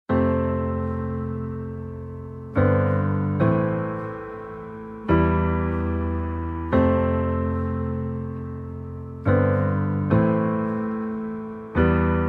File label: Instrumental